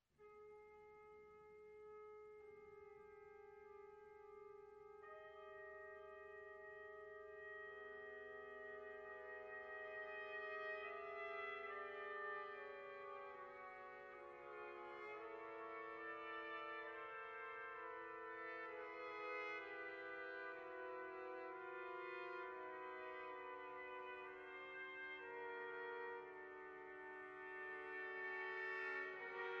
Symphony for Brass and Percussion, Lento Desolato

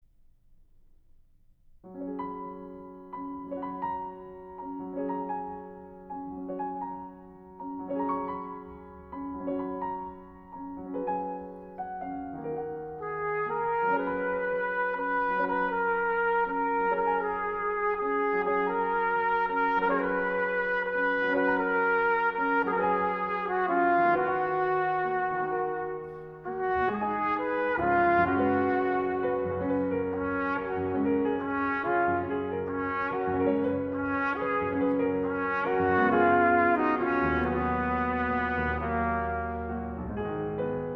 Sonata for Trumpet and Piano, Allegretto

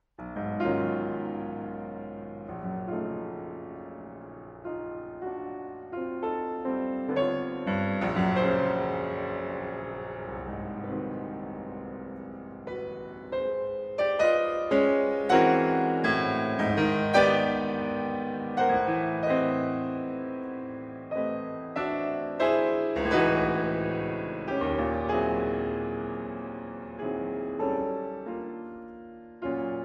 Sonata For Bassoon & Piano, Adagio molto

